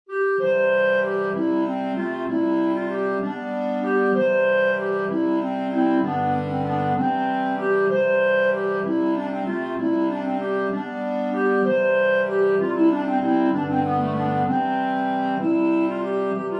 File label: Clarinet; Byrd; Wolseys Wilde